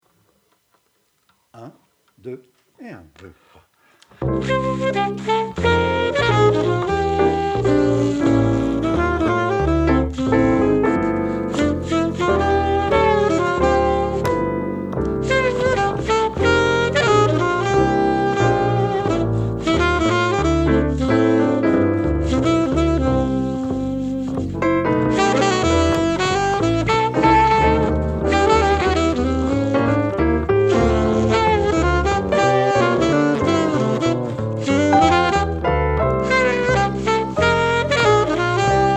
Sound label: Cool Jazz